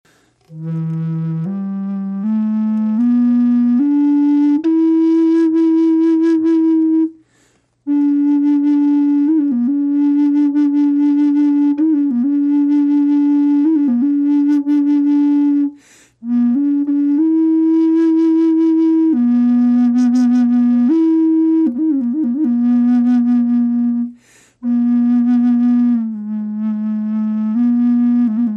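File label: natural, wood flute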